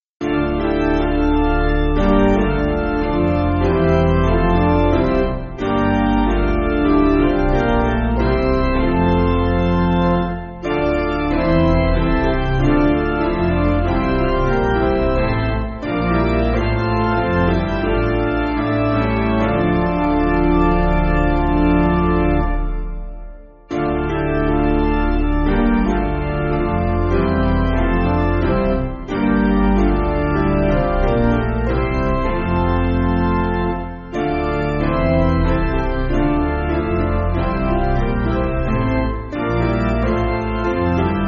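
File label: Pipe Organ, Music Backing Files for Churches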